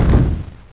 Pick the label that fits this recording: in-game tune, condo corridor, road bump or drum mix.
road bump